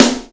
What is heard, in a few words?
snare